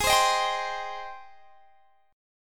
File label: Ab Major 7th Ab down; AbM7 strummed